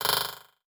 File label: Multimedia Internet CD-Rom Flash Click Multiple